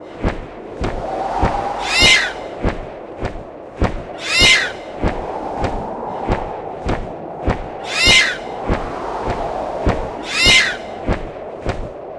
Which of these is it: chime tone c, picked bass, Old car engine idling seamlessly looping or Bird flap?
Bird flap